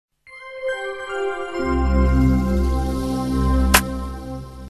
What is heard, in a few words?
clapboard